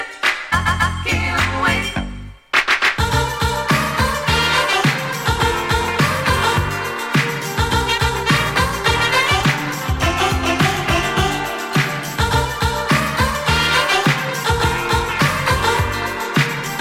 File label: 12 Inch Dance 80s Groove